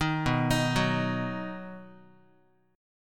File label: B Major, Guitar, 4A,4E,5A,5D,5G sharp,6C sharp; arpeggiated; JGuitar